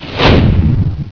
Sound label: Missile Launch; Missions of the Reliant II